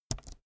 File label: keyboard laptop space press slow soft